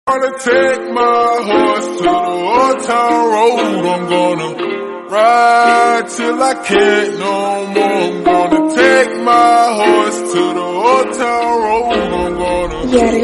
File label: english race song